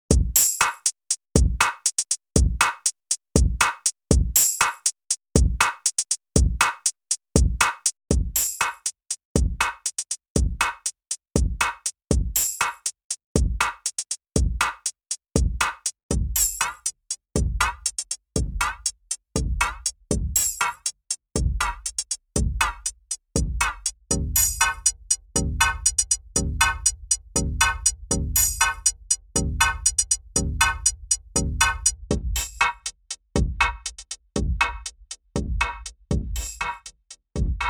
STRING FILTER Drumloop; CHERRY AUDIO RACKMODE

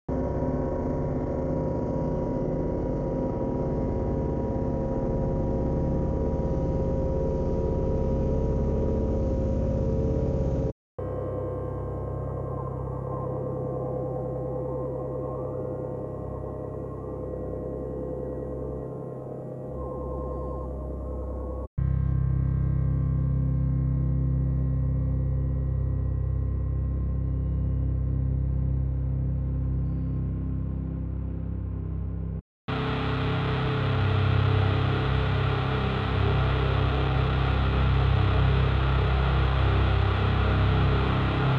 Perc FX Ambiences Pads

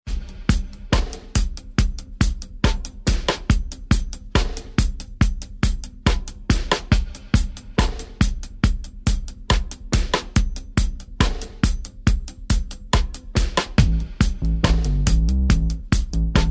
reggae; ampify

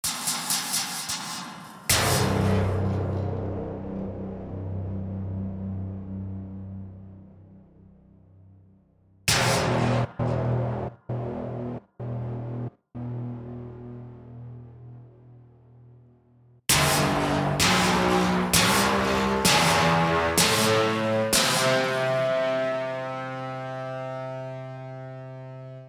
Dirty Slam Hits

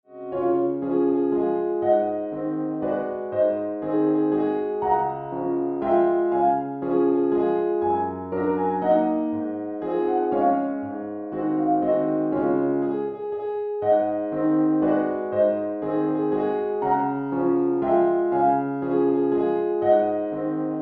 Piano; wusik

